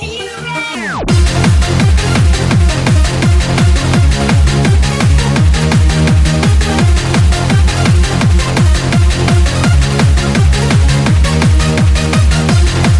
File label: hardcore trance are you ready